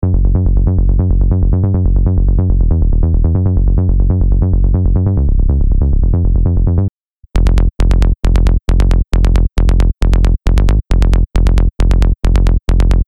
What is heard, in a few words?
Trance Psytrance